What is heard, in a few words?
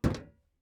metal land